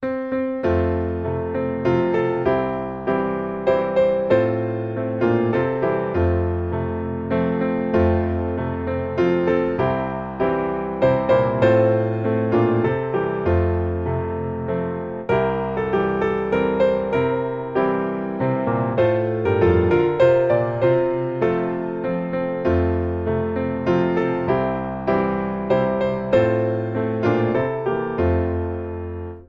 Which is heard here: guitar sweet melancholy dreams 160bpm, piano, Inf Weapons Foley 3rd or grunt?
piano